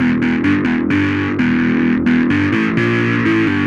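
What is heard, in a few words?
Mean Bass Loop 130bpm D minor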